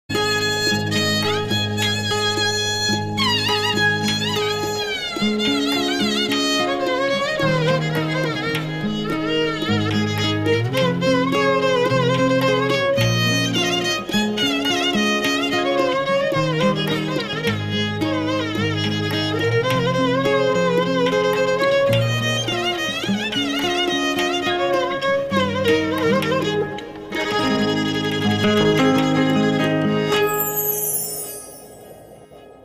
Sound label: Ghijak; تاجیکی